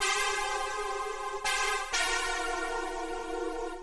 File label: 125 Softpad C F; PowerFX - Nu Jazz House